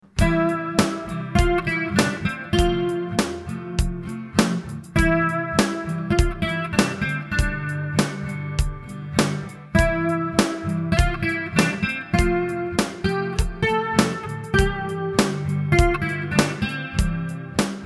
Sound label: reverb arena